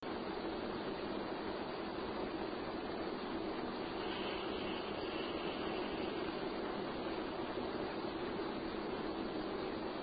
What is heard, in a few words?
Slide sound; Game Room